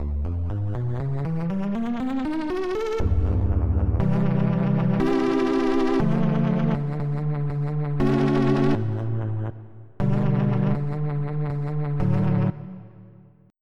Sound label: Lead 5 (Charang); FF7